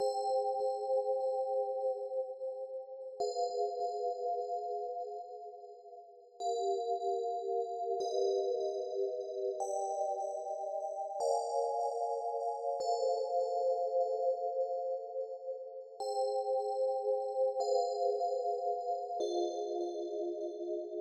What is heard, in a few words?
Bell Piano Soft